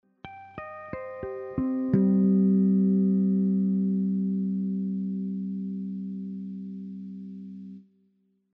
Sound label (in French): Effet harpe, guitare harmoniques